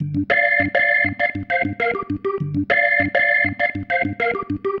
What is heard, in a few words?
Pattern, Organ